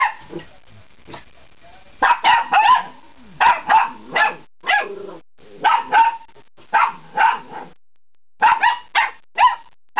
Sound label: bark sample